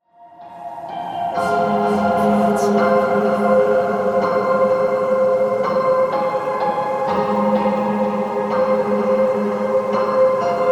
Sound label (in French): Pendulum Lost Imaginary piano prepare effets